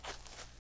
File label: sand; desert